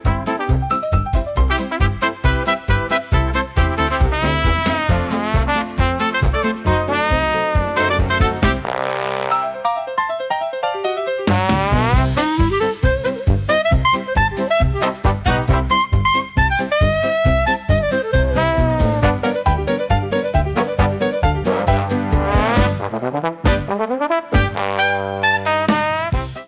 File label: gypsy dixie, ragtime and dixieland